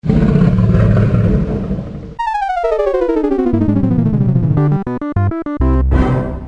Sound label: atari jaguar system startup jingle; 44.1khz stereo 16-bit